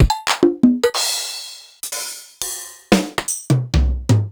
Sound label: Korg KR-Mini; Sliced